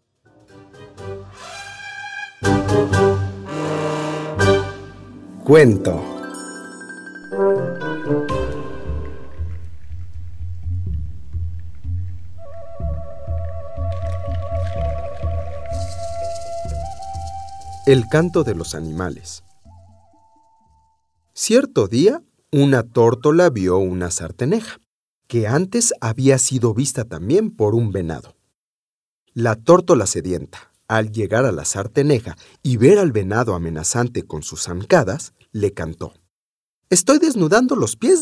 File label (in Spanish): El canto de los animales